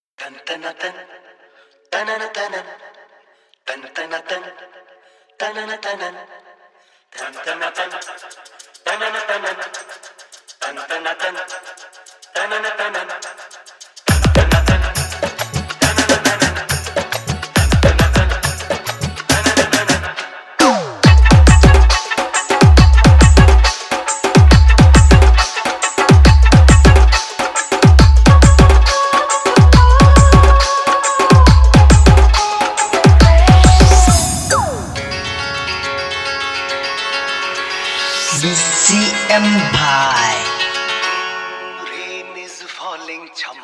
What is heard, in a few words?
Hindi Tapori Dance Mix